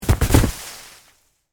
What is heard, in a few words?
Object Roll Snow, Weather, Object, Roll, In, Snow; BlastWave